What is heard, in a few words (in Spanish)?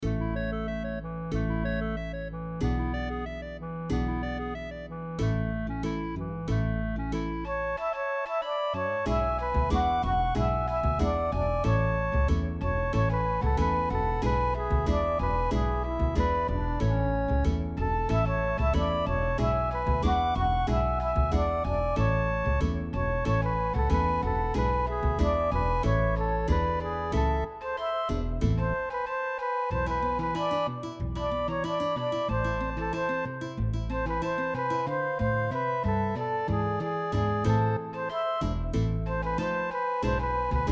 Milonga MIDI Bass nylon clarinete flauta